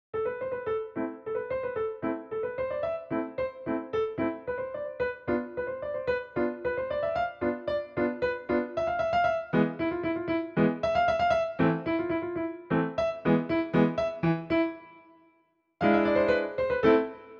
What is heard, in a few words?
Movie Thriller